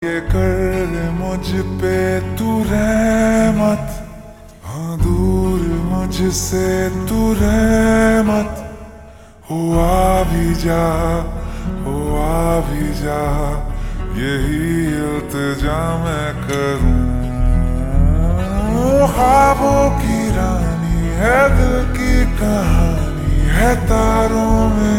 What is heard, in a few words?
Ringtone Slowed Reverb